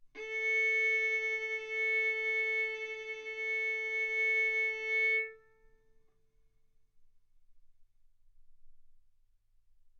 Violin arco mf sul A A4 B4 stereo

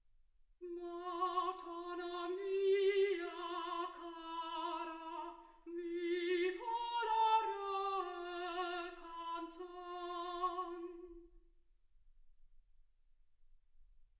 soprano

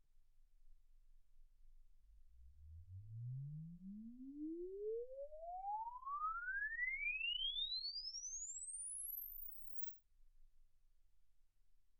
exp swept sinus 10Hz 24kHz

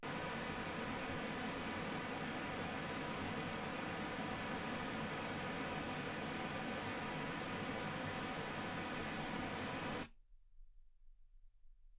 pink noise 48kHz; single mic

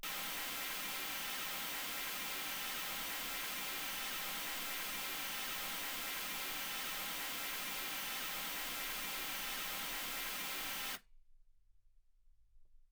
mls 48kHz

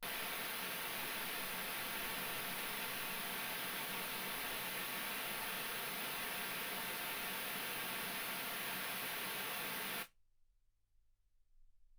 wgn

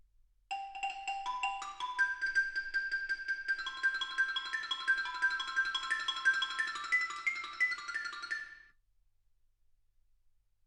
xylophone

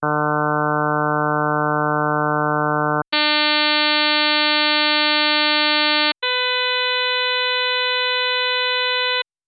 harm sinus 48kHz

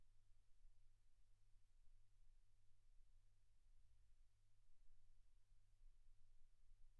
silence 48kHz

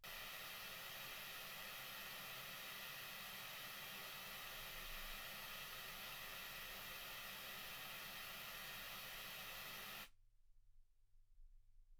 wgn

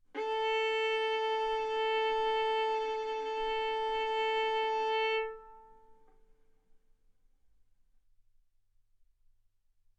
Violin arco mf sul A A4 B4 stereo

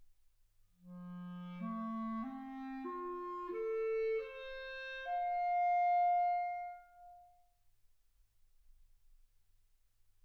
clarinet

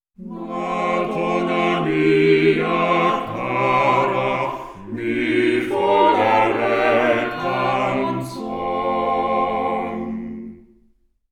quartet

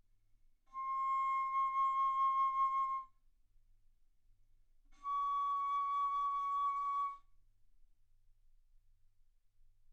Bass Flute ff C6 Db6

